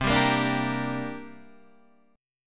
C 7th Suspended 2nd C down Guitar Open D

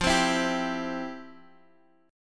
F Major 7th Suspended 2nd, Guitar, Standard; strummed; FM7sus2; x,x,3,5,5,3; JGuitar

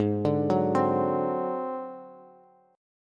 G sharp 7th Flat 5th, Bass; arpeggiated; chord; 16,17,16,17

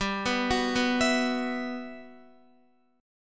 G Major 13th, Guitar; Listen to GM13 arpeggiated

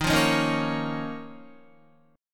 D sharp 7th Suspended 2nd, Guitar; D#7sus2; strummed

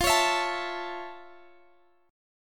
A Major F; strummed

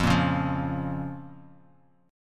F Suspended 4th Sharp 5th F; strummed; 13,13,11,10; JGuitar

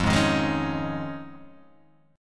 F Minor 7th Sharp 5th

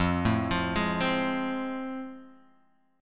A Minor Major 7th Sharp 5th, F

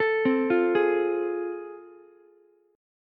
A Minor Major 7th Sharp 5th, Ukulele; arpeggiated; AmM7#5; JGuitar

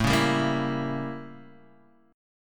A 7th Flat 9th Guitar Open D; 7,4,5,4,7,x

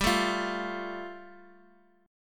G 7th Suspended 2nd, G, Guitar, DADGAD; x,x,x,0,0,3; down

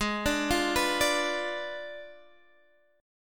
G sharp Diminished 7th, Guitar, Standard; arpeggiated; capo 9; chordshape; 16,17,15,16,15,x

